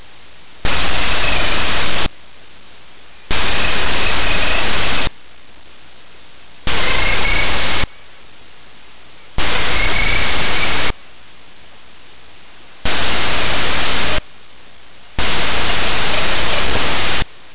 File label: PURR; Game birds; Game birds and what islands you find them on